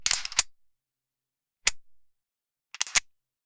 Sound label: the metal firearm is load; magazine